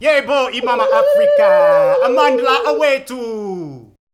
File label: Group Chants; Spectrasonics; Supreme Beats; African Contemporary; Yebo